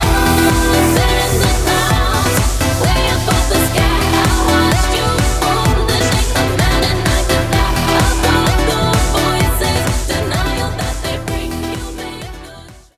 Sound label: Stinger; DFH Stadium